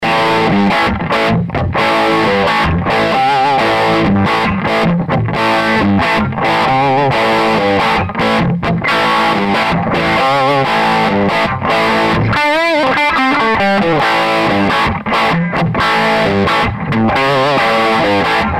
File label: les paul bridged